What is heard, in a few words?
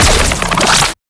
electric grab, battery holder; mechanical units